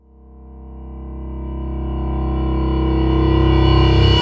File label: Tonal FX C